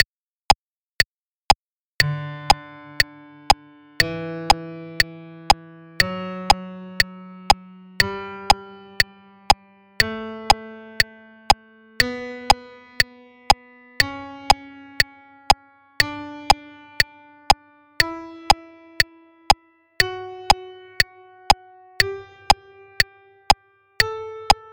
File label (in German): Atemkontrolle Intonation